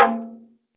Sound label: Bongo